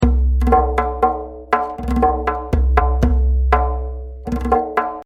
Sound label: Beat 120